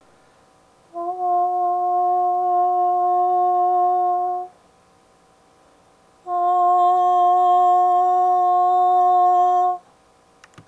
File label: head chest